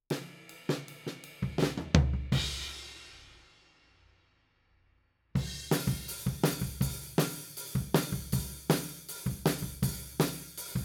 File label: Floor, 160 BPM